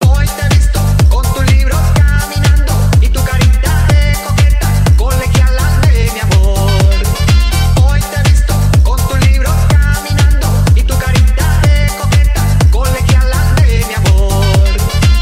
Ritmo

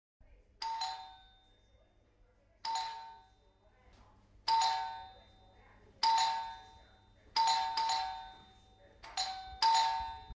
Campanello casa

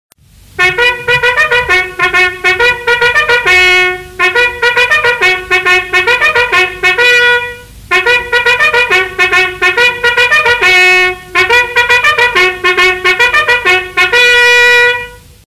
militare digitale telefonica

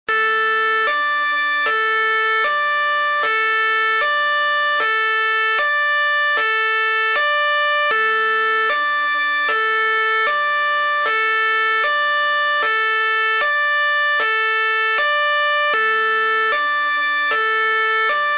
Sirena Polizia

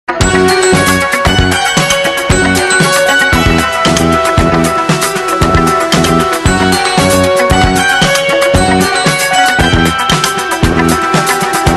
Suoneria Ringtone; KIT SUPERCAR

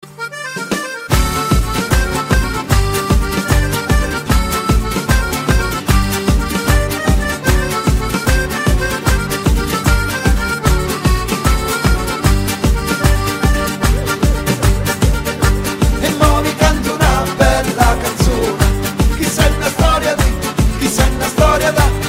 Tarantella

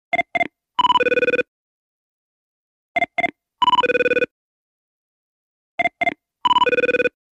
Citofono

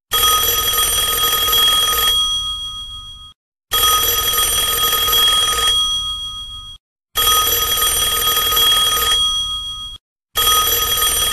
Telefono antico

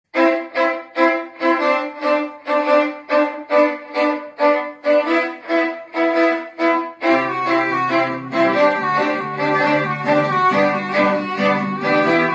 violino; Coronavirus Porto Empedocle

